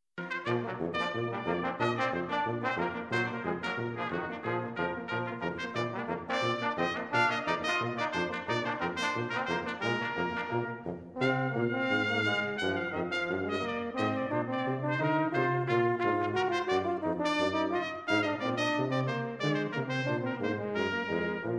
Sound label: brass, blues